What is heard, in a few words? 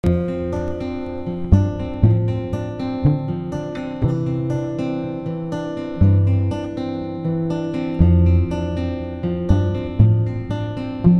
Bassline